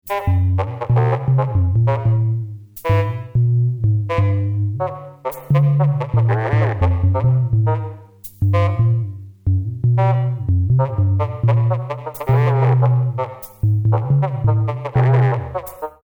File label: jazz